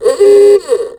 Horn Upgrade, Kart; AOS RF1 Kart Demo

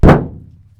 impact car tap; Kart; AOS RF1 Kart Demo

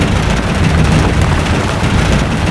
grass roll, Tires; Kart; AOS RF1 Kart Demo

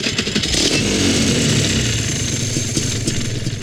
start in, Kart

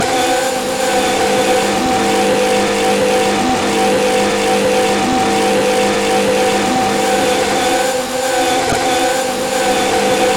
ex CMAX, F1; Italy; AOS F1 2022

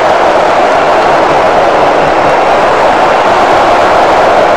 scrub dry, Tires; Kart; AOS RF1 Kart Demo

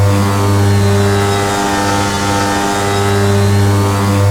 KART IN ON LOW, kart

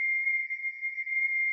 brakes low in, Kart

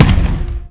pop, Kart

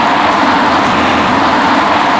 road scrub wet, Tires; Kart; AOS RF1 Kart Demo

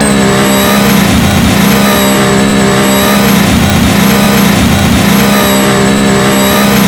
in CMEDlow; Germany; AOS F1 2022

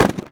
gear2 shift down ex, Kart

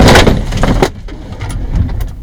impact car medium; Kart

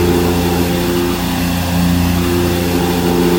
IN ONIDLE; AOS F1 2022

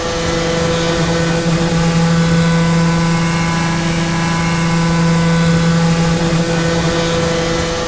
KART IN OFF HIGH, kart

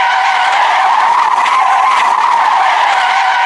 road skid, Tires; AOS RF1 Kart Demo

